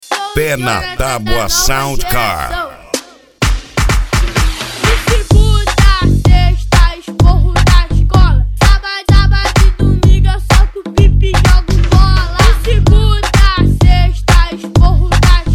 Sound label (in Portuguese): sound car carnaval